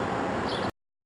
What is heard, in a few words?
yellowthroat flight, common yellowthroat